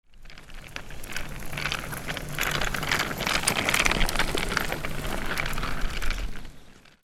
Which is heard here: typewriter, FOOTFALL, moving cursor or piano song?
FOOTFALL